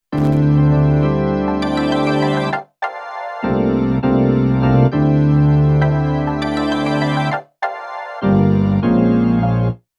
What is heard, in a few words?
synth organ, Emu Planet Phatt; a Motorola MC 68000 CPU (mc68hc00fn10) system handles up to 32 voices of polyphony